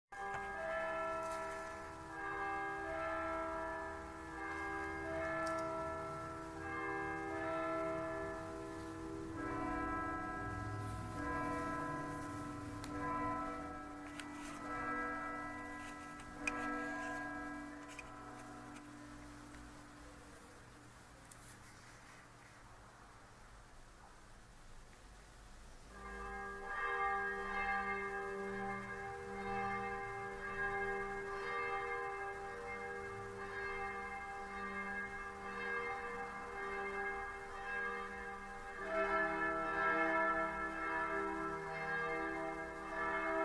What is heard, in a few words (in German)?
Glocken Impro